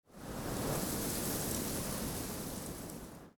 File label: Forest Open Glade Wind Gust Dry Grass, Ambience, Day